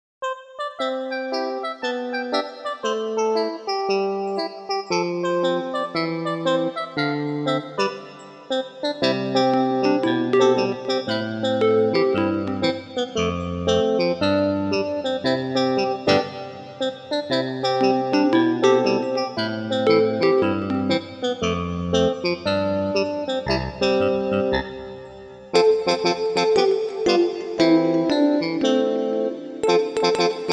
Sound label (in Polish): wersja instrumentalna; EduMuzArte